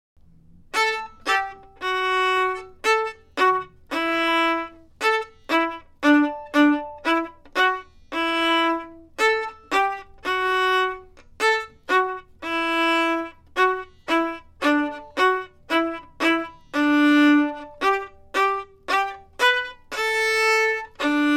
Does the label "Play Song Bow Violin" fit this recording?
Yes